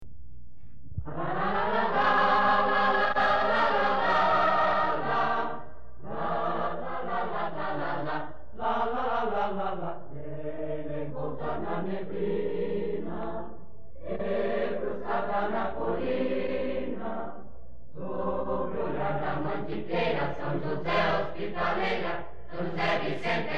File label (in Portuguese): hino sjc cantado